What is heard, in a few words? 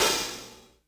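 open hi-hat Acoustic Crush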